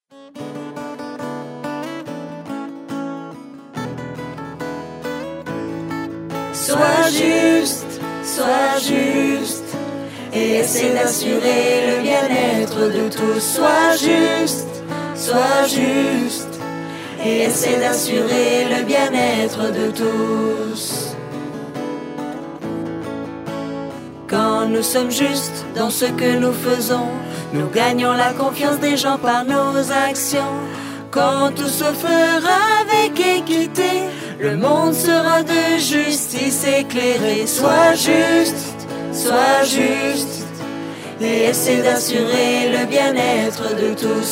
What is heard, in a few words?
Song, FR